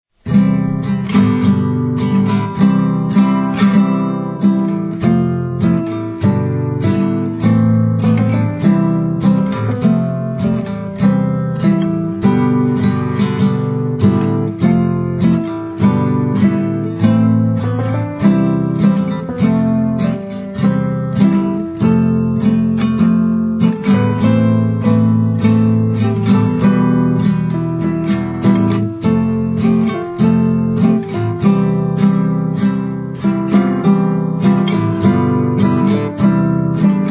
World Song Accompaniment on Guitar and Melody on Piano